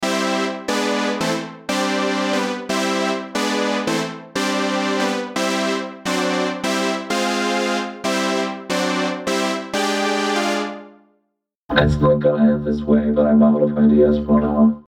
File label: harmony test